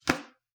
book close

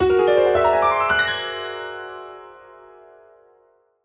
Mystery Arp; soundscrate

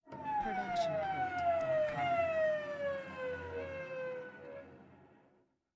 Cello Seagull Effect; SoundsCrate

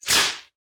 snake attack

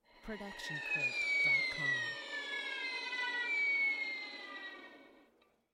Violin Seagull Effect

